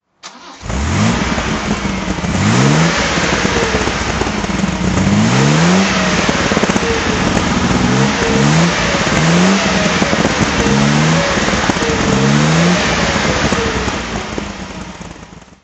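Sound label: mg mgc gt throttle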